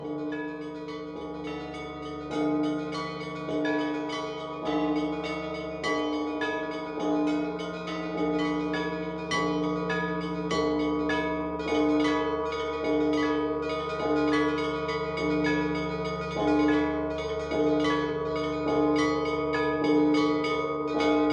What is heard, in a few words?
Festal Bell-ringing